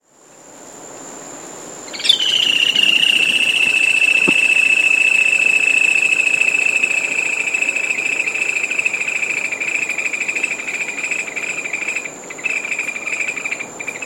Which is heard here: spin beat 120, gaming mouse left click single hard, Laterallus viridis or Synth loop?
Laterallus viridis